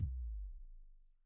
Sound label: transition impact hit